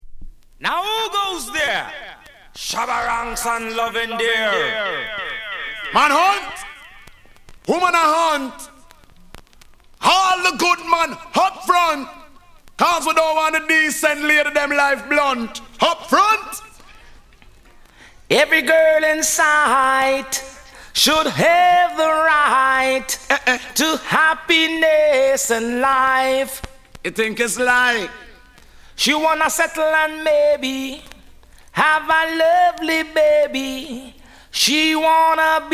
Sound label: MANHUNT ACAPELLA